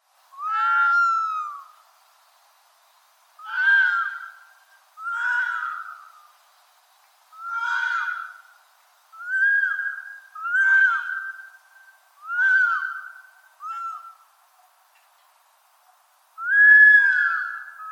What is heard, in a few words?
red fox vulpes vulpes